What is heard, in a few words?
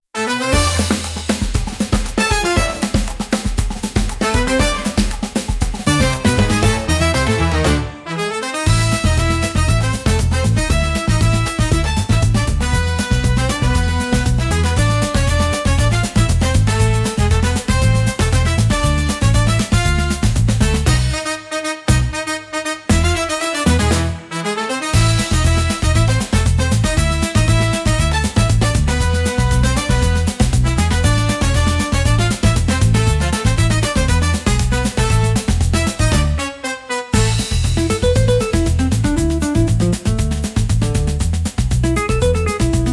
Carnival Stampede, upbeat carnival; for festivals and parades